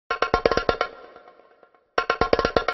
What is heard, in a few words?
oriental sms ringtone